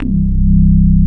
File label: ANAREZO C2, SD1 AN BASS; East West - Global Sounds